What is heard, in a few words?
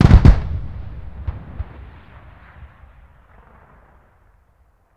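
weapon anti-aircraft gun firing distant short; Explosions; Call of Duty Modern Warfare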